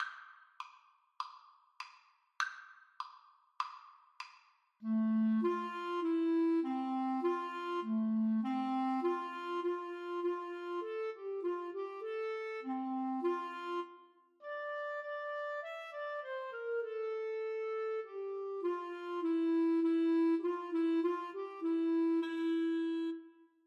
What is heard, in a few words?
Clarinet Duet